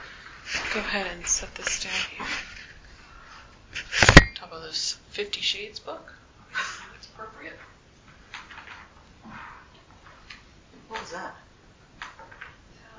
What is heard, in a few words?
Attic EVP; andrew bayne memorial library follow up investigation